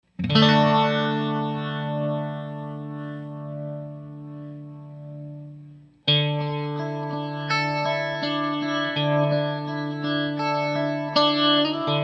Boss RT-20 setting 3, RT-20 Mode 3. Leslie plis Marshall amp sim with gain.; Boss RT-20 Sound Clip 3 - RT-20 Mode 3. Leslie plis Marshall amp sim with gain.